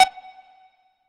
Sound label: Metal Detector Blip; Prison Architect